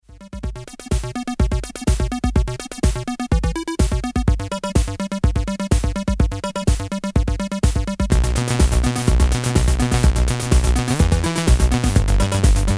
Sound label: Instrumental